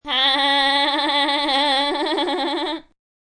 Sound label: Completely obnoxious laugh.; Annoying Laugh; Funny Sounds; Comedy